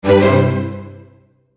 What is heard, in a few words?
Ta-Da Strings Fanfare; Cartoon Sound Design Sound Effects